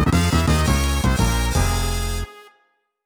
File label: Bonus Lose; Donkey Kong Country 2- Diddy's Kong Quest